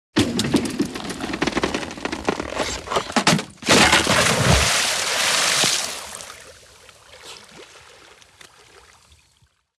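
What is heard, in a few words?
Ice Breaks Long Crack, breaks exterior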